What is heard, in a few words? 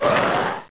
dismount